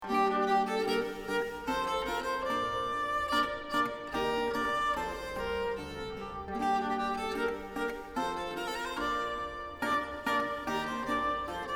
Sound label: viol branle; branle de montirande; praetorius 1612